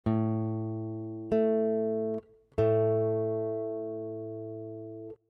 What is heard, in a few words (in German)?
Intervalle Oktave